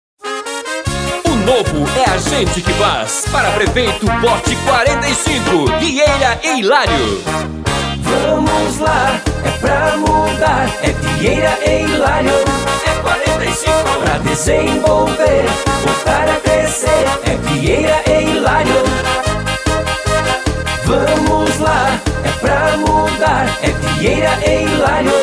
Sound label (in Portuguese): jingle parodia